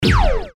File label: laser gun; sound effects library